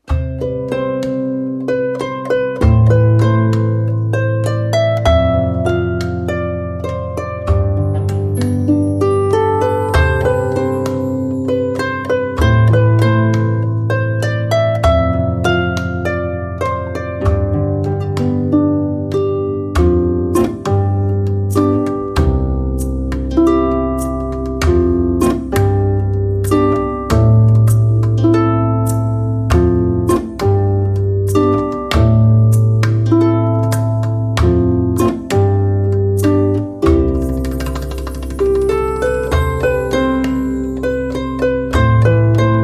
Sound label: No Dulcimer